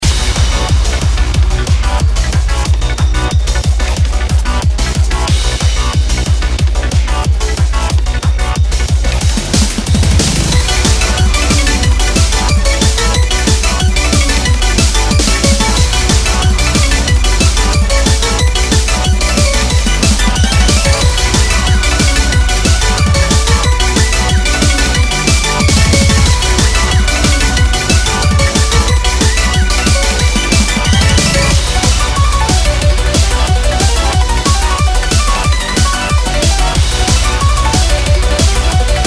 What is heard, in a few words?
Raid Boss; Mist Train Girls